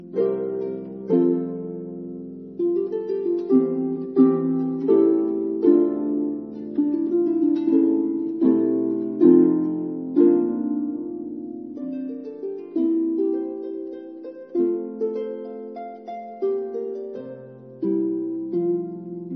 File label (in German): Rondo Harfe